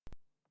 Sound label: silence